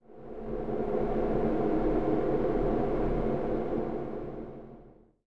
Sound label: magic dire, ambient soundscapes; dota